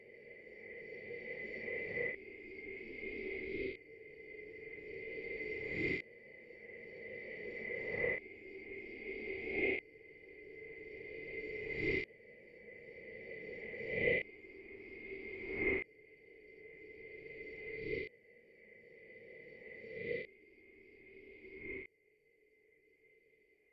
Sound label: Backwards Souls; ui; menu main; shroom of doom